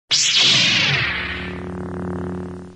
lightsaber ignite

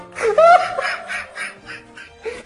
huhahahi

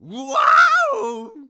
wawoo

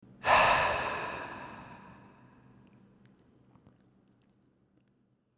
ghost breath

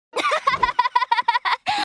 laughing; Luan

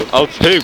altos

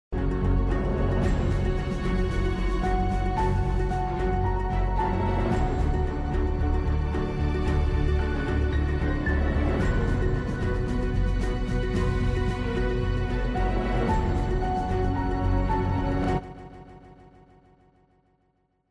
doku intro

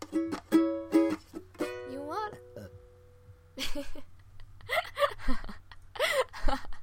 borp

